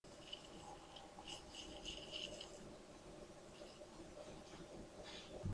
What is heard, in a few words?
frog